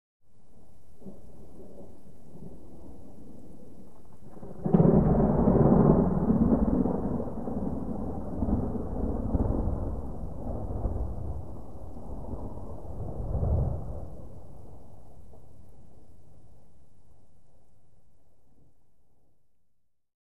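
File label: Thunder Low Rumble, distant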